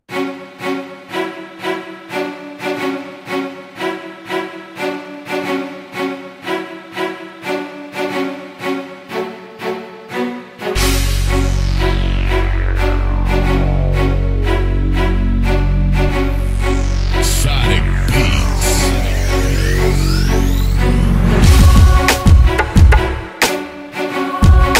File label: Violin Rap